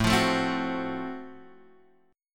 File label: F sharp Minor Major 7th Flat 5th, guitar